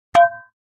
Frying Pan Hit - Light; Hollywood Edge; sound designer's toolkit v2

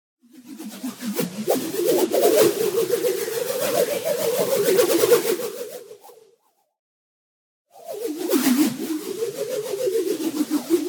MOVEMENT LONG White Noise Sine Wave Spin; boom library; Anime Essentials

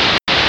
walkie noise; DeadFright

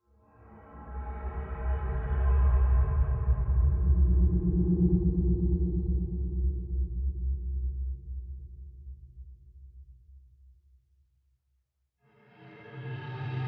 suspense loop; moodsounds; Audio Game maker Sound Library

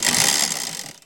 Menu Mechanical

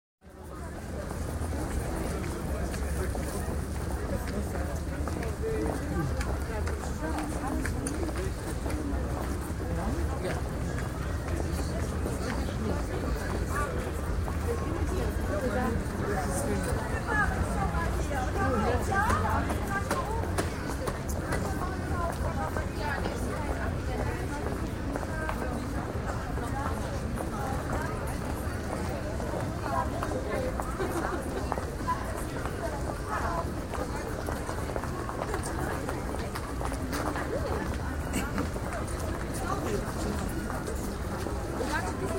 Shopping Mall Large Voices And Footsteps; German; Foreign; Sound Ideas; Series 2000

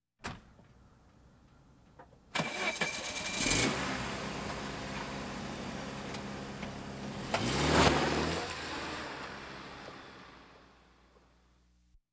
Automobiles various

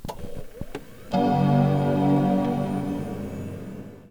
Computer Powerup Mac; SFX Kit; Foley - Misc

